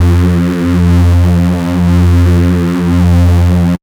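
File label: bass sustain fizz F; Polyend; ARC Noise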